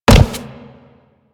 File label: Step Metal, Footsteps; Warframe; Grineer